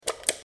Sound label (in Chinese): 点击